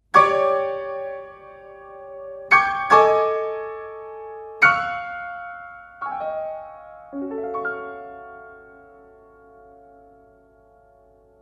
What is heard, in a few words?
very percussive